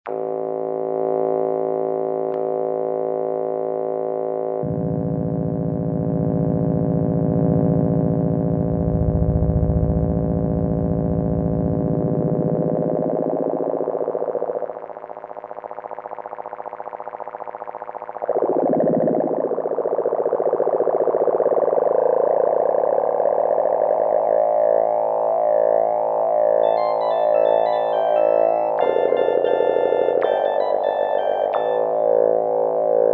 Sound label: LFO Ringmod